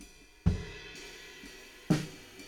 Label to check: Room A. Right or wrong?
Right